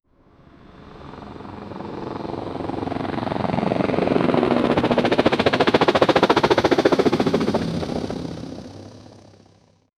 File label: helicopter fly by